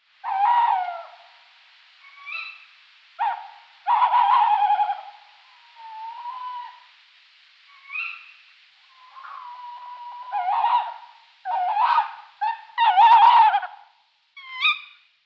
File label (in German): Waldkauz